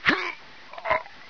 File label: die, hgrunt; Source Engine; bshift